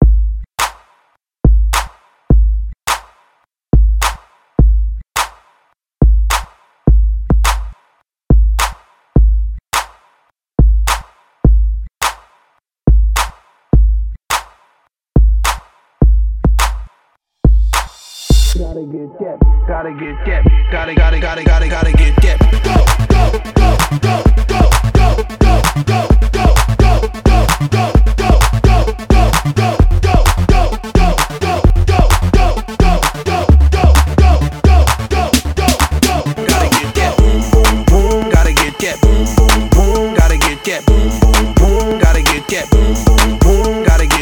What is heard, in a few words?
transition break track 105 130